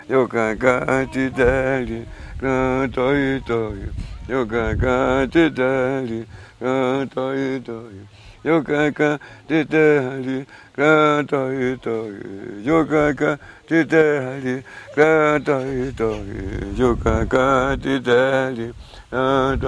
timbiras

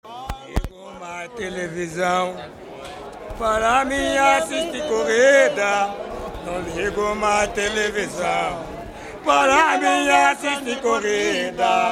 batuque de umbigada tiete; ayrton senna

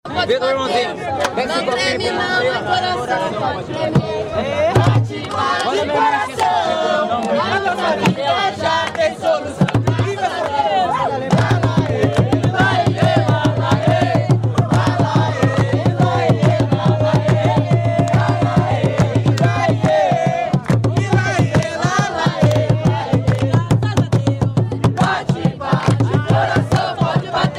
jongo de guaratingueta

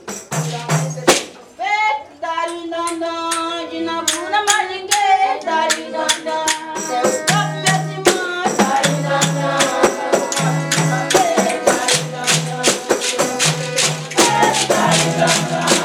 fanti baiao de princesas juramento; Baião de Princesas é um ritual especialíssimo e único que acontece apenas uma vez ao ano

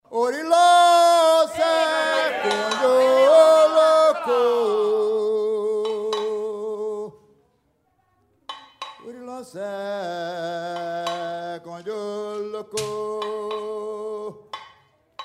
toada para orumila

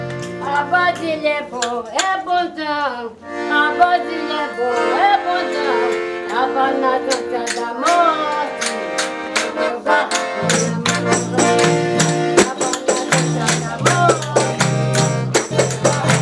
fanti baiao de princesas abasilio; Baião de Princesas é um ritual especialíssimo e único que acontece apenas uma vez ao ano

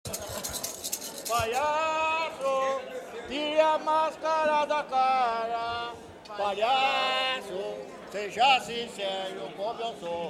batuque de umbigada tiete palhaco

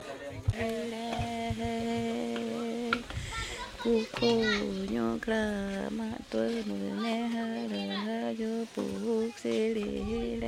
timbiras

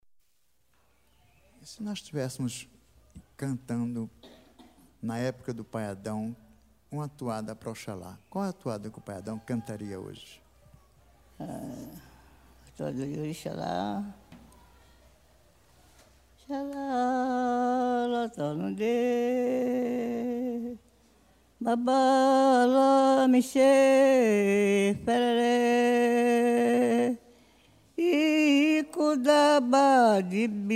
toada para oxalufa

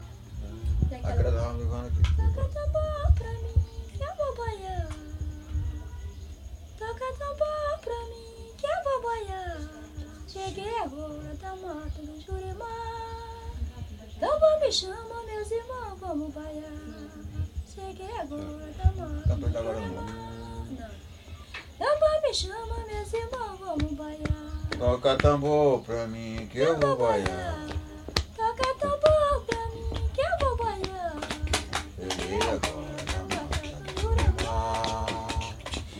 toca tambor

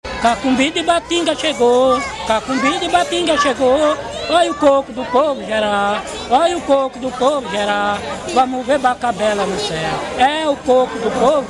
cacumbi

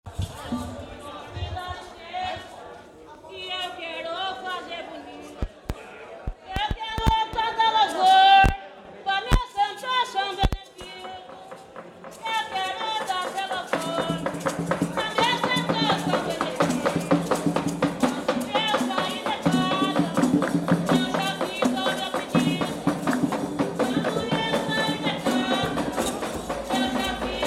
batuque de umbigada; tiete; me da licenca

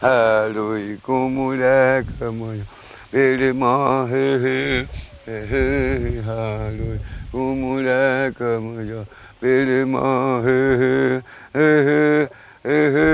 timbiras

